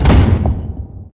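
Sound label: DOOR close; thief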